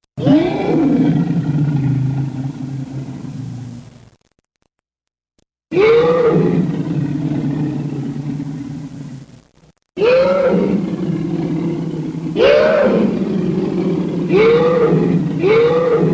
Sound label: Monster